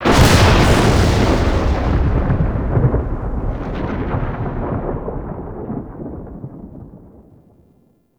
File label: Sky Thunder Clap; Duviri Murmur